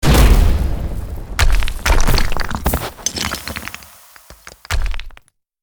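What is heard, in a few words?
cap death; Ensign; war3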